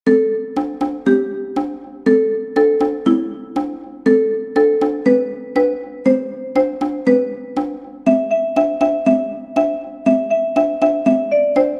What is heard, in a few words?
Cajon; Trommle ich auf der Cajon